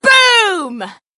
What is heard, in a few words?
boom